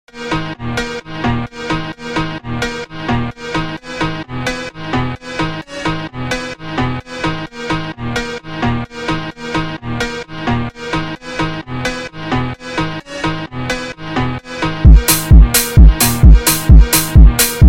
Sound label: Phonk Remix, Superbass; call Of Duty Zombies